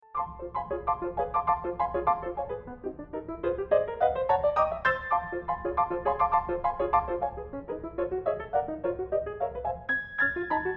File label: Steinway; Mussorgsky